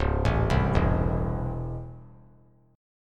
Db Minor Major 7th Sharp 5th Db down; DbmM7#5 arpeggiated; JGuitar

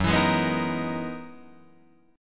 F sharp 13th F sharp Guitar Drop D

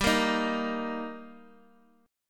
G Minor Sharp 5th G Guitar DADGAD, chord

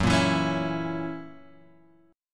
F sharp 7th; F#7 Chord {2 1 2 X 2 0} strummed

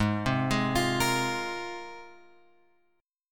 G sharp 6th Add 9th G sharp; G#6add9 Chord {4 3 6 X 6 6} arpeggiated

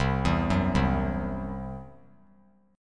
C-Major Flat 5th; arpeggiated

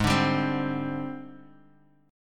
G sharp Major 7th Flat 5th; strummed; chord

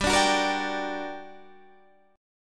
G Minor Major 7th, down, Guitar, Standard

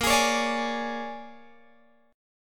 A sharp 7th Sharp 9th, Guitar, Open G

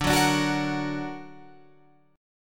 D Augmented D down Guitar Open F; D+ strummed; JGuitar

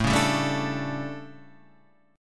A 11th, guitar chordshape; A11 Chord {5 4 5 2 3 5} strummed

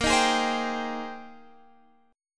Bb 13th Suspended 4th; Bb13sus; strummed

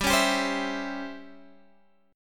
C sharp Minor Major 7th Flat 5th G, chord

